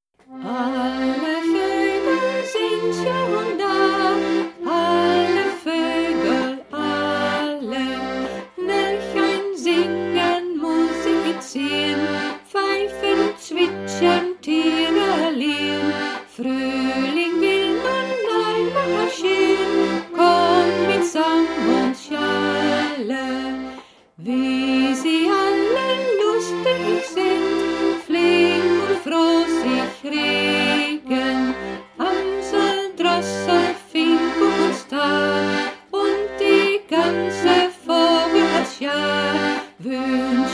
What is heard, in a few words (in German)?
Alle Vogel sind schon da gesungen